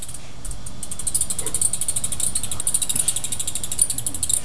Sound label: bat angry